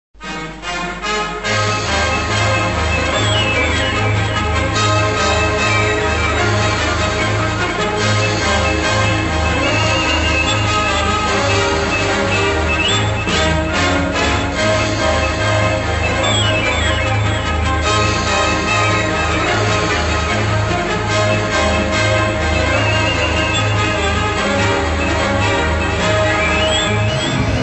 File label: horn of plenty